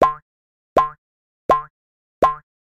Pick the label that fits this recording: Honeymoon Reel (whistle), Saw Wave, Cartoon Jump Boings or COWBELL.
Cartoon Jump Boings